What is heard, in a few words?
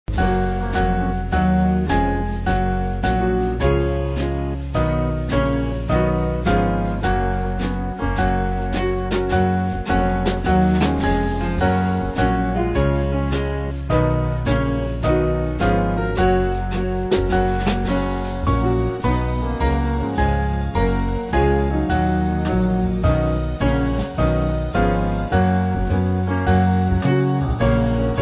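Band, Music Backing Files for Churches; Tempus Adest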